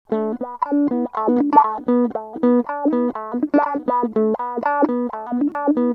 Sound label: Wah Wah